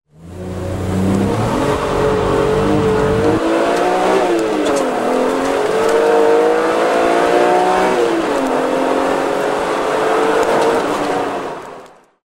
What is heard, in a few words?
BMW M Roadster; biler